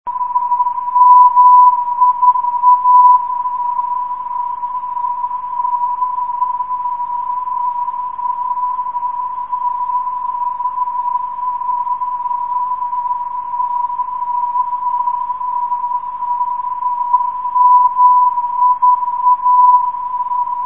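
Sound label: non-directional beacon, 292 kHz; ndb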